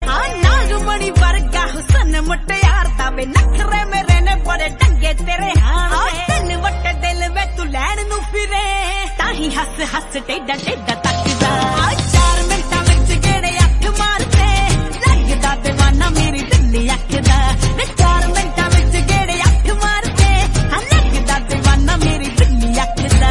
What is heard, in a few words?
Punjabi Ringtone